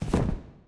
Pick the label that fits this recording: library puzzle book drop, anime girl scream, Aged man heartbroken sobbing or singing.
library puzzle book drop